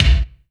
EFEX BD, FX DRUM

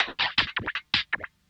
LOOP L, Drum & Bass 160 BPM

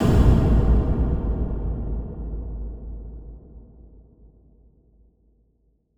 Huge Verb Tail, Long Reverb Tails; impact samples

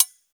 TOY HH OP, MUSIC BOX; AKAI S6000 CD-ROM - Volume 1

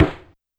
Side Stick, YAMAHA RM1X

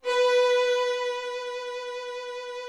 VIOLIN B3; Greytsounds VOL-1

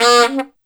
F#2 Horn, Yamaha PSR-E323 Sound Effect Kit; drum machine

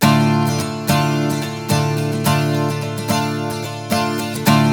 Blues Guitar Samples; ILIO; Fretworks; 108 NATL RIFFS

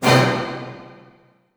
F# MIN FF, orchestra hits; Sound On Sound - Peter Siedleczek's Orchestra

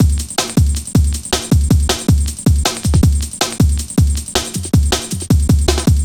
Drum Loops, 158.5; Jungle

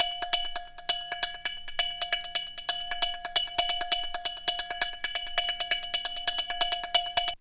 DRUM, 132; Megabass Remix! 2

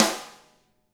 DW2 RIM, Drums; DW KIT1

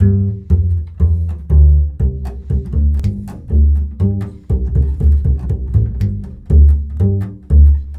Bull Fiddle, Appalachian

